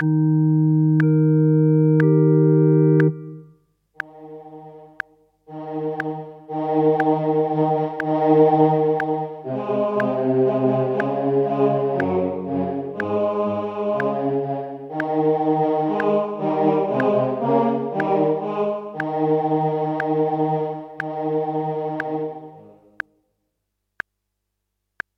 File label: O clap your hands; Gibbons